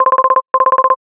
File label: ringer, chirp